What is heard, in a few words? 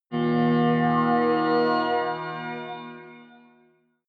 sci-fi saturn rising